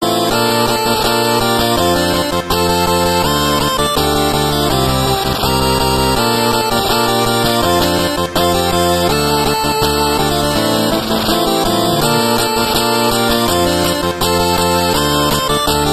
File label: 8-bit